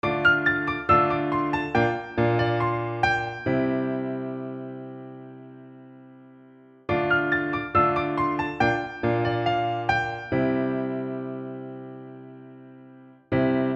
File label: Piano